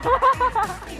giggle

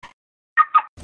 Car alarm

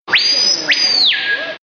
Bird Lipaugus; Bird Lipaugus Vocife

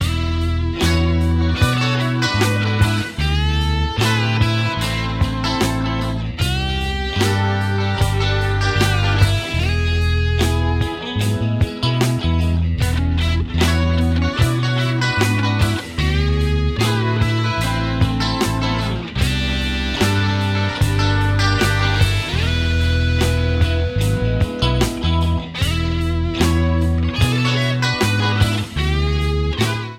Slow Jamming Summer

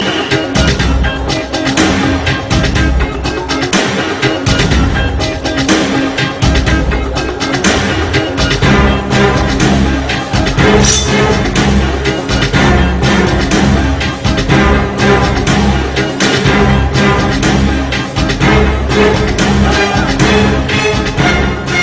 bgm; Paiya

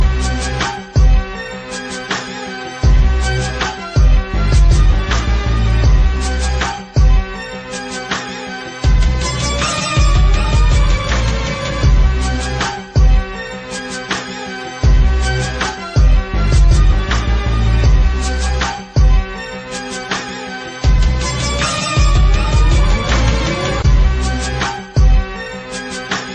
Gangsta Beat

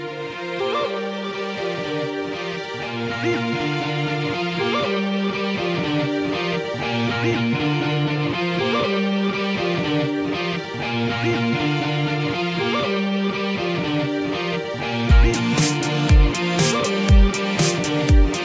Music jam

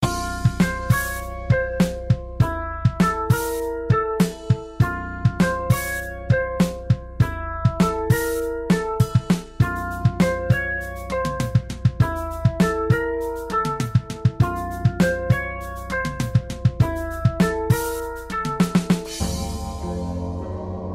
Soft Guitar

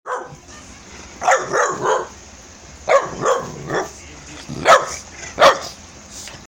Barking boxer; meloboom

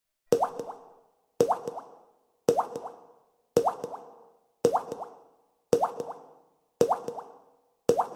Waterdrops

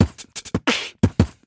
Beatbox

